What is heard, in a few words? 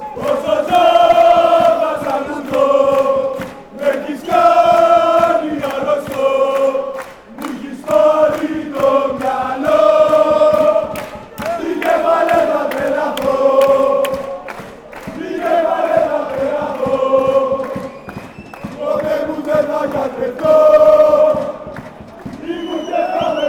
aek athens chant, fanchants